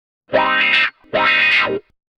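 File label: 112 Cm DISCO ELECTRO guitar, 112 BPM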